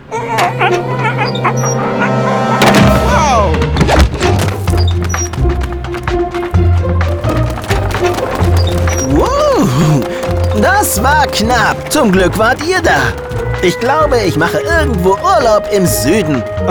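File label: Outro Victory German; arthur and the revenge of maltazard; wii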